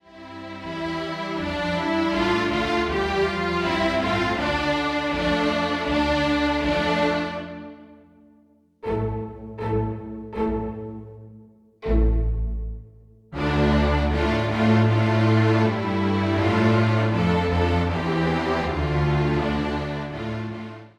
ensemble de cordes; Traditionnel; Kis kece lanyom La jeune fille en blanc

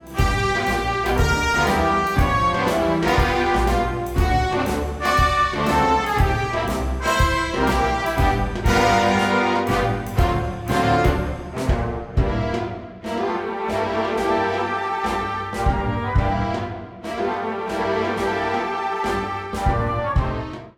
orchestre symphonique

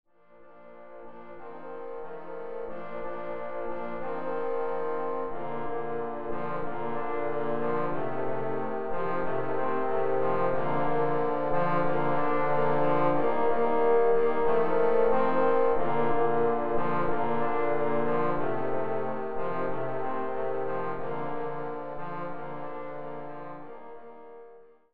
trios de trombones

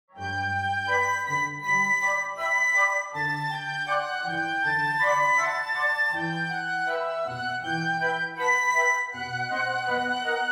Shalom Aleichem Variations flutes violoncelle; Traditionnel

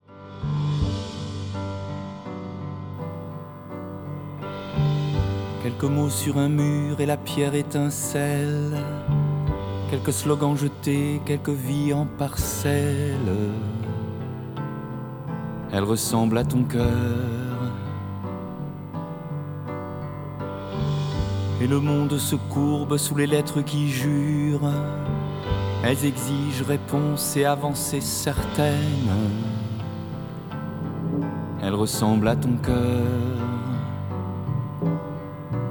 piano voix

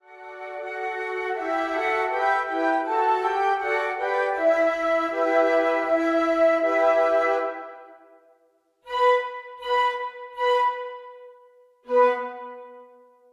4 flutes; Traditionnel; Kis kece lanyom La jeune fille en blanc

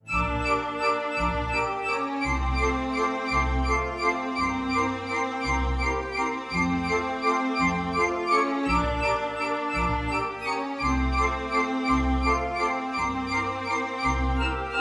Valse flutes violoncelle contrebasse; Waldteufel; Les Patineurs

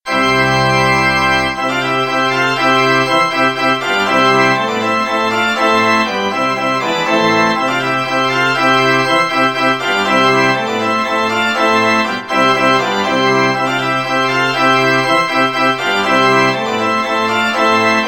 Danse slave, orgue; dvorak a; opus 46 n°1

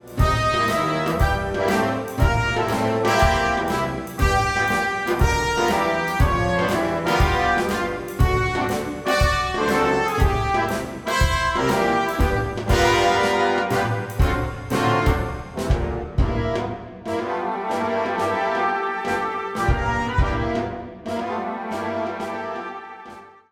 orchestre d harmonie

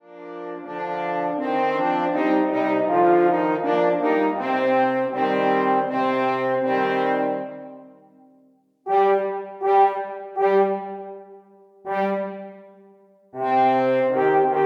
Traditionnel, 3 cors; Kis kece lanyom La jeune fille en blanc

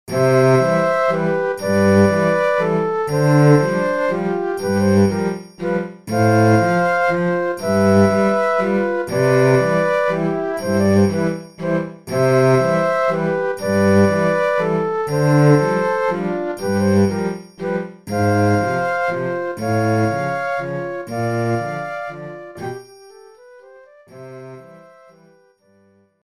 flutes violoncelles triangle; Tchaikovski PI; La Valse des Fleurs

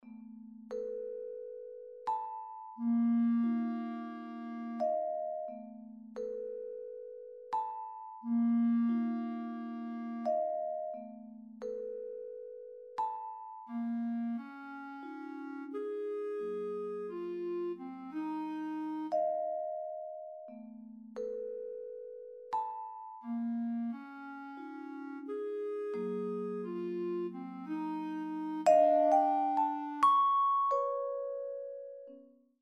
clarinette vibraphone

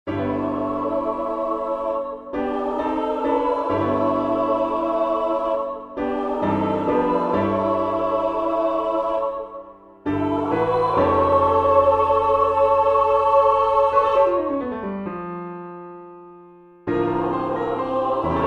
Lento 3 voix piano

